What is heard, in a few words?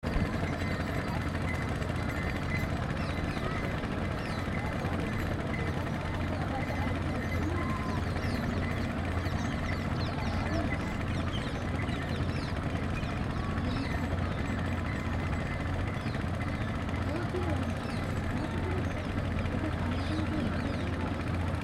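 Varanasi morning engines; Banaris; North India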